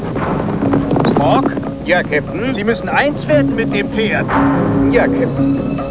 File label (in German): pferd